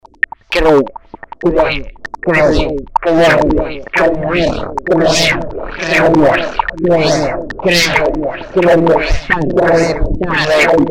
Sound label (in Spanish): Crepitar